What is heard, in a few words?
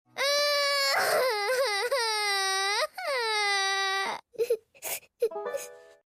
crying; ladybird lu